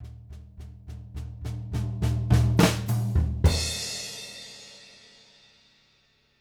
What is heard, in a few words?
JZ70 SWING, Living Drums; Sampleheads; Peter Erskine